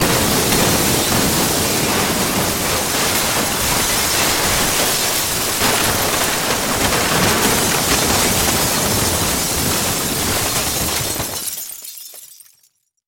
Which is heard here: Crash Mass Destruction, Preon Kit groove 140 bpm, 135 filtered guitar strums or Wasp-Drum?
Crash Mass Destruction